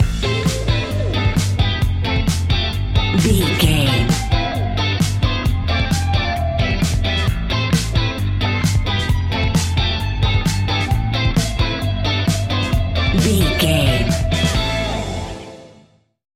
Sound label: A fast paced piece of electronic dance music